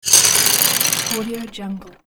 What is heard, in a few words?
sticks rolling